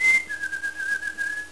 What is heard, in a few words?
bird call; The Fishin' Hole